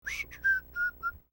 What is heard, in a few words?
whistling